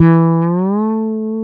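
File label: SLIDE E-A, FRETLESS BASS; Northstar - New Gold VOL-2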